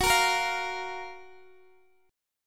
Gb Major 7th, Guitar DADGAD; chordshape; down

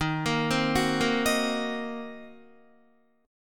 D sharp Minor 6th D sharp down Guitar; arpeggiated

